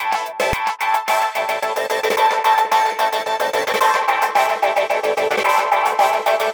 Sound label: Disco TransitionFX